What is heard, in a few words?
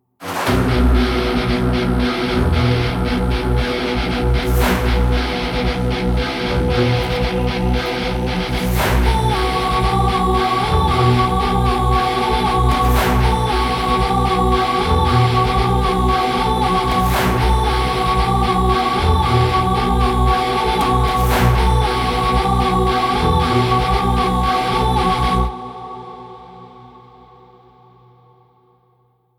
Boss Fight